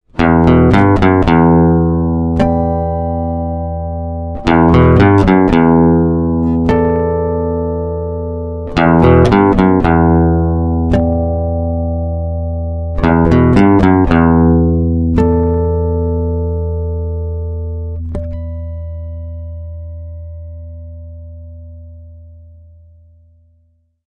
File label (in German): Gitarre Uebersteuert